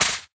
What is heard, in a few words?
Shadow Minion Attack Slash